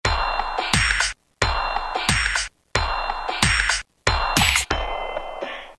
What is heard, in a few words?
loop bits x2; Roland M-DC1